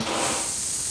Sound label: steam vent hiss start; Star Wars Obi Wan; XBOX